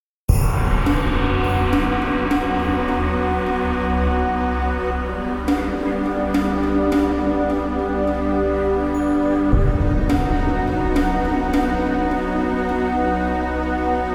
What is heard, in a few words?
Grand Ambience; X3 Gold Edition; Build Trailer Soundtrack